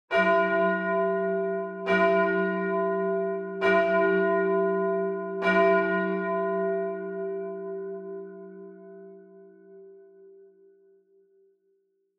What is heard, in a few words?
Church Bell, High Long